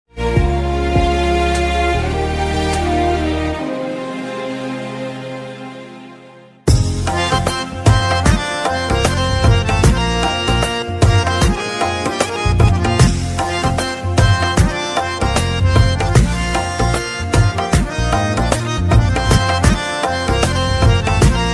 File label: Pakistani BGM ringtone; Bismil OST